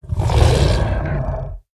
Snap Dragon Play Sound

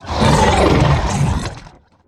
Fungal Guardian Death

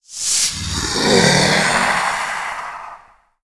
Shadow Of Nothing, Death

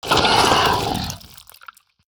Bloodworm Attack

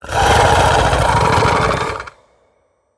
Death Beetle Play

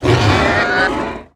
Duskboar Death

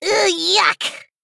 Female Gnome Attack

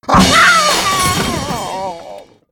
Windup Musician Death

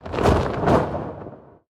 Attack Sound

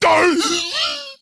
Male Trogg Death

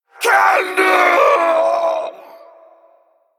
Male Kobold Death

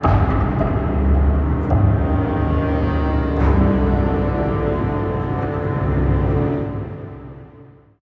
Legendary Stinger; Gigafin

